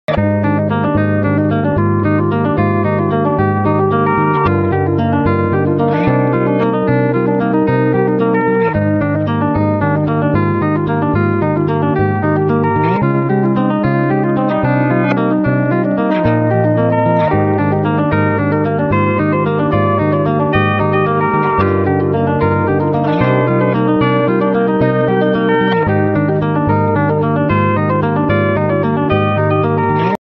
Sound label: Ringtone